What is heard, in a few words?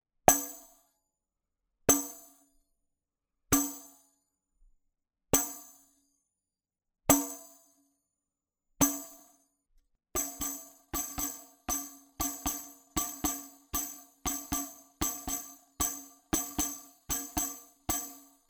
Tamborine